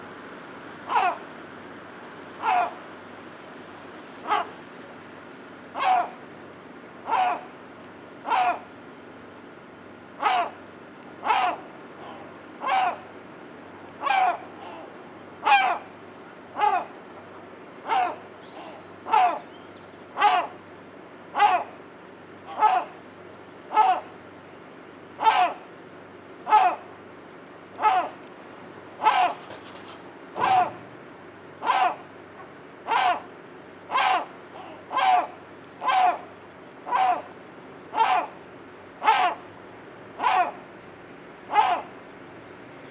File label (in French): goeland